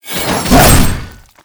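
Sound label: guardian sobek attack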